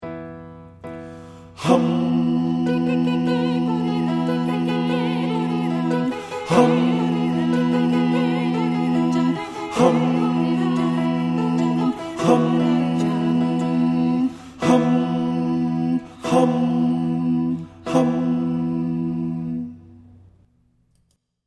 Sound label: Bass; Prince William All-County